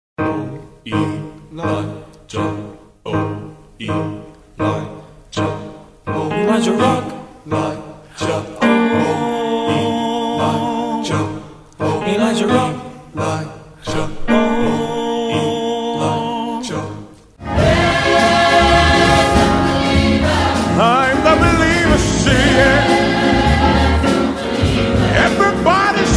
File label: choir